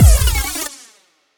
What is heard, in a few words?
Magnetic Destroy Shot